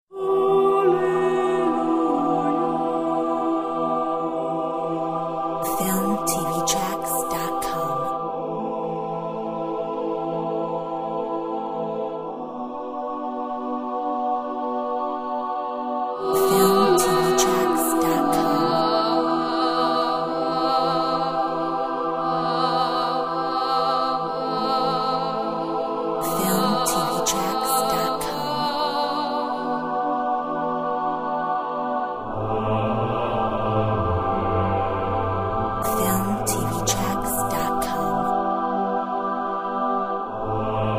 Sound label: Gregorian choir